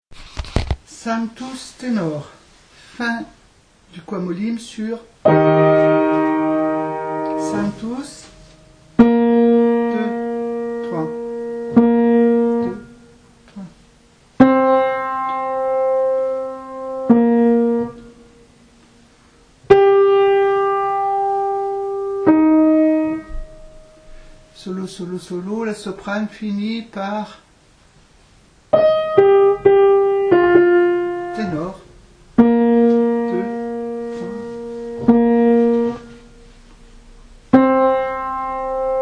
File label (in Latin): Sanctus, Tenor; Niccolò Jommelli, Missa pro defunctis